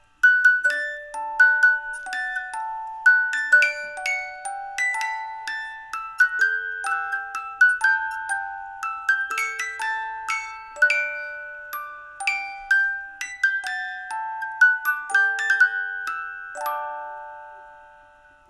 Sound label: brahms lullaby